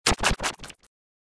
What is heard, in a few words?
hurl; sorcery